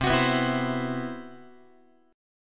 C Major 7th Flat 5th C, Guitar; CM7b5 strummed

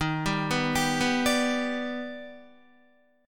Eb Augmented Major 7th Eb down Guitar; Eb+M7 arpeggiated; JGuitar

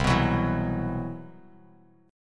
C Major Sharp 11th, Bass Standard; CM#11 strummed; JGuitar

